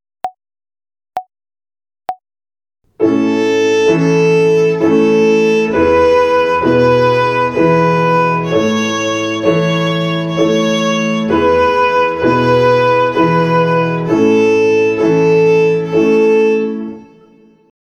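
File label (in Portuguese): Ginástica até o dedo 2 - Corda LÁ - Ternária, Violino; Violino para os Pequeninos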